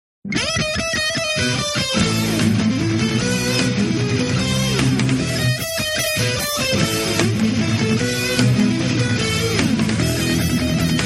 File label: fur elise metal ringtone